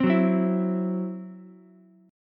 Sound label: C-Major Flat 5th, Ukulele; strummed